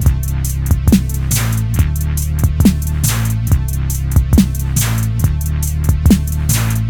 Roland SBX 1 Shuffle 50

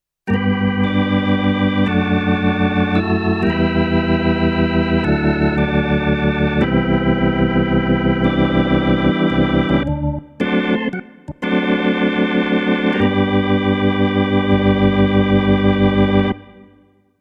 Yamaha CK88 Gospel Organ

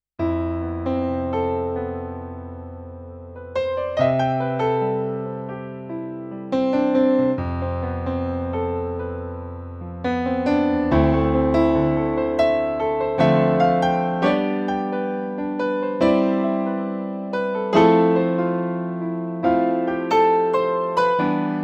Grand Piano

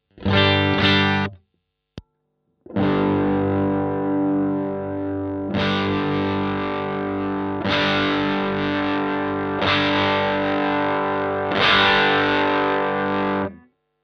BM20 ULTRA Gain max Si Si Tone Range

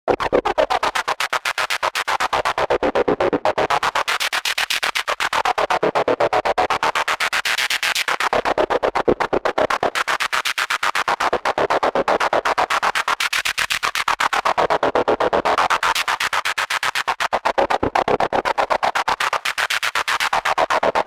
Agressive BP Leadbass

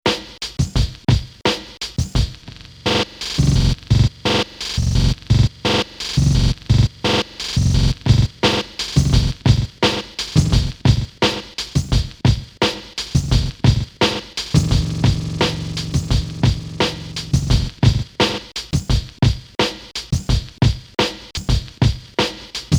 Akai MPC 2.10 Stutter

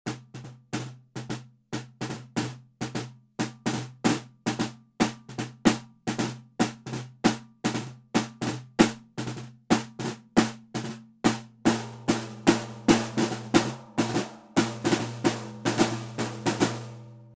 Snare

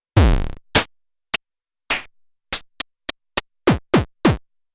Mini Kit; KOPRO+

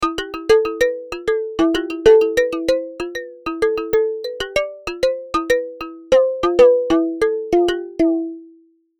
Morphing Can drum

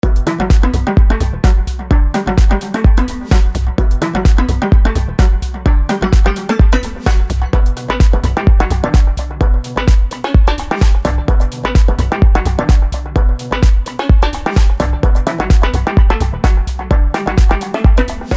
Avenger 2 The Mallets; Vengeance Sound; Melodic Tech Voices 1

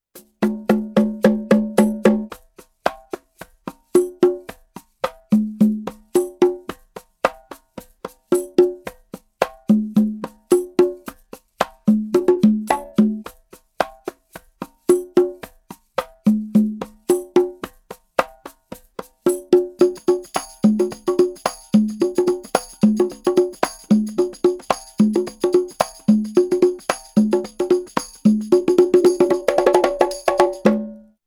Korg KR 55 Pro Unplugged

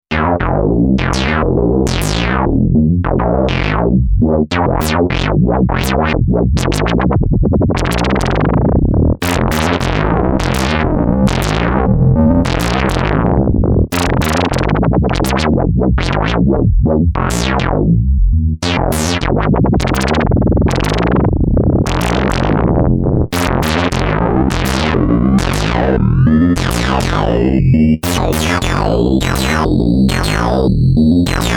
CV LFO

